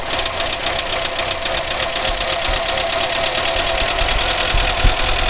dacia, vehicles

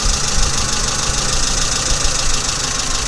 solenza, Vehicles, Dacia

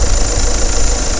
renault symbol, Vehicles

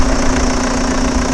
opel astra, vehicles

